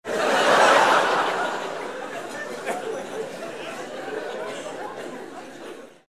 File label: laugh long